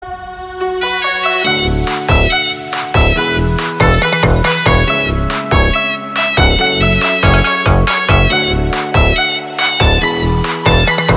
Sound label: remix